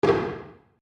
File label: Wood On Wood Floor; Material components for constructing more complex sounds; Sound Effects:Materials